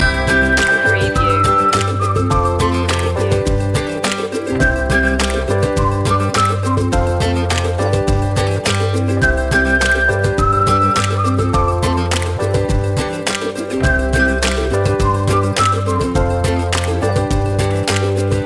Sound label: so happy loop